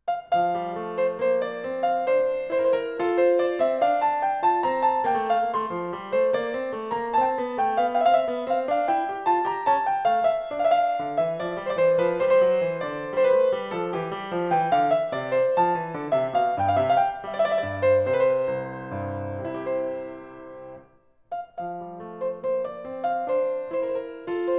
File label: piano; Bach; Suite anglaise N°4 BWV 809; Courante